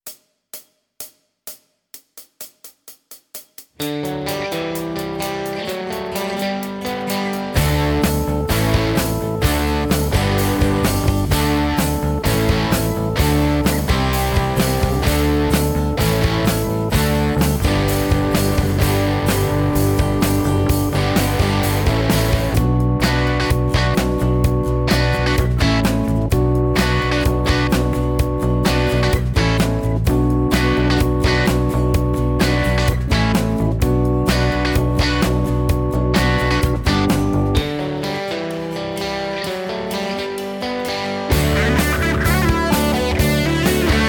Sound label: Rock, Guitar; Guitar Techniques 2019 Issue 290 January